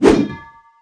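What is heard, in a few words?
Prisoner Attack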